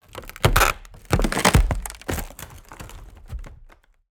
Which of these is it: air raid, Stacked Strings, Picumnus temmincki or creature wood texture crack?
creature wood texture crack